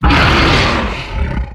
Exertion Heavy; Evolve; Behemoth

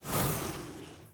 Burning Hands Whoosh Tails; Evolve; Meteor Goliath

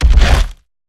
Climb Base; Evolve; Behemoth

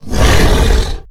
Exertion Light; Evolve; Goliath

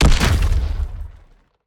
Stealth Attack Land; Evolve; Behemoth

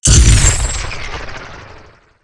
Teleport; Evolve; Wraith

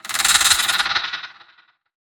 Lurker Sniff; Evolve; Gorgon

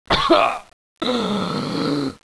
stomach hit

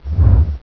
swing huge; Jaminporlants

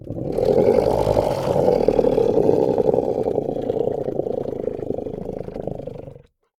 Grounder Idle, Kraken; Evolve

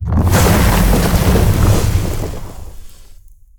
Seismic Blast Staircase; Evolve; Behemoth

